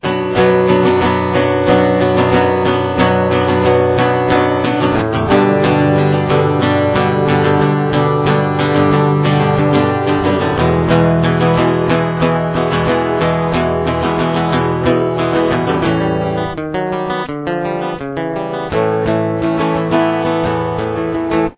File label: chorus, guitar